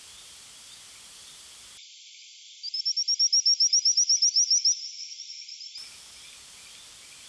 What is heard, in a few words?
Boophis kirki, Ranomafana